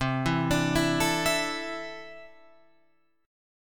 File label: C 6th Add 9th C down Guitar Open F; C6add9 arpeggiated